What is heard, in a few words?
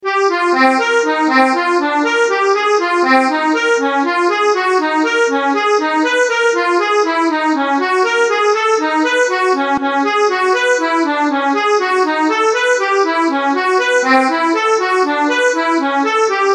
Feedback